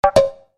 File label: Chime Percussion; Web Interactive; Technology; Multimedia; Musical